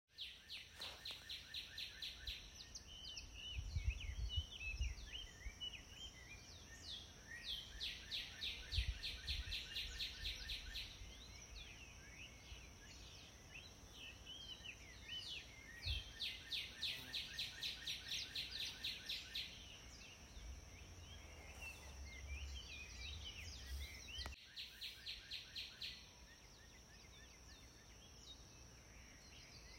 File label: nature birds singing